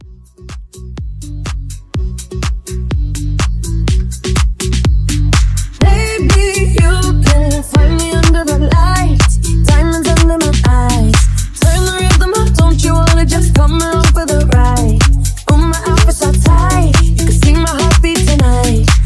Mashup, 124

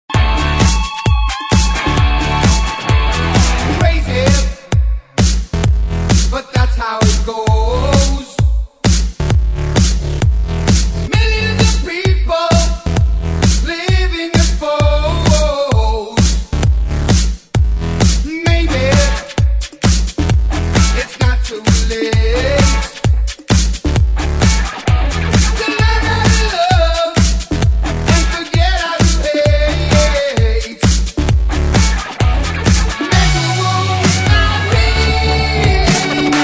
Club Party Banger

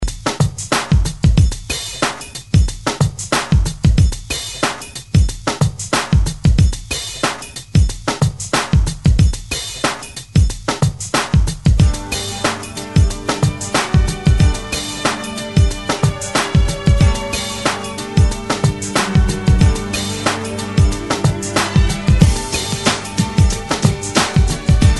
90s ReDrum 92 Bpm; DJDOLLS

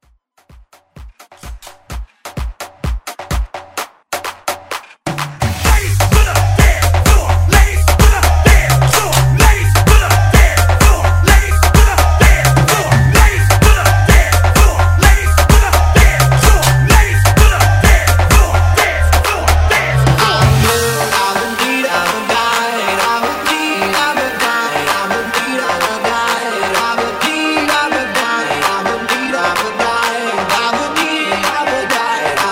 Afro Hype Edit

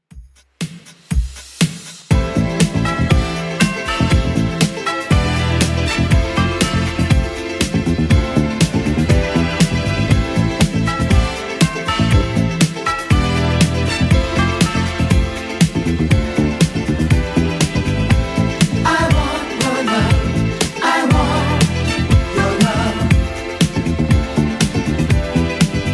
80s Club 120 bpm; 80s Club Edit

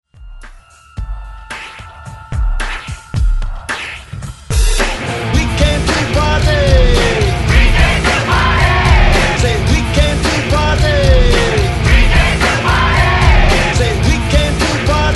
Rock Hype 110Bpm

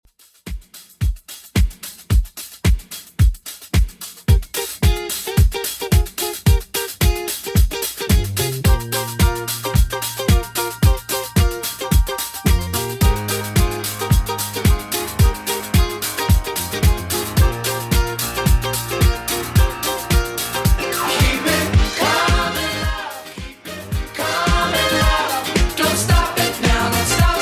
70s Redrum 110bpm

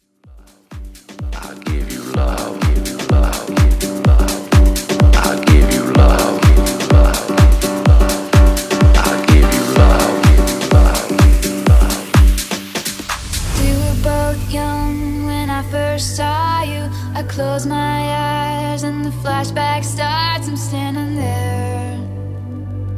Future House

